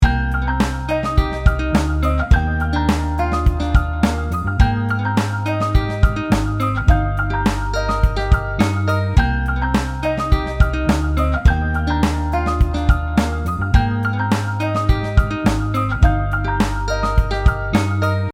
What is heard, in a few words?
FATSO 5 harmonics TAPE